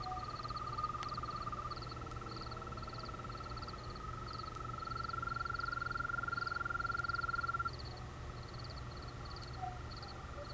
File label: Bufo viridis, Rufe